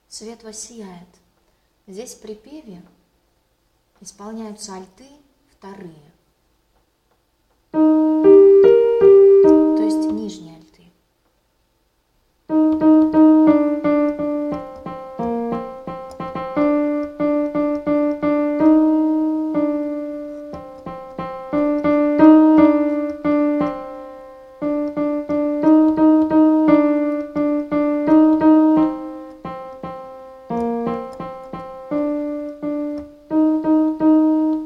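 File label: 2nd altos; Svet vossijaet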